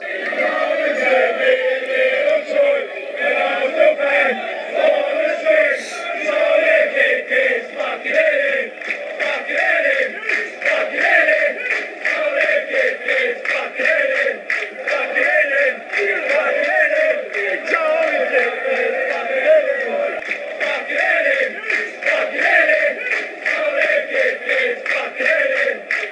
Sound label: an arsenal fan on a string, chants